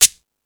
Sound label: Timbale, E-MU Pro-Cussion